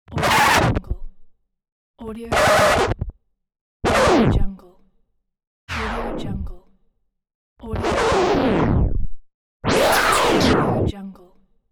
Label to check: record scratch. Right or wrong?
Right